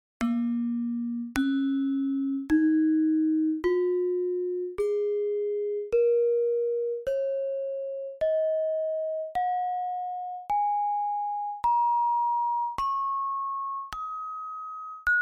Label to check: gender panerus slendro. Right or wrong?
Right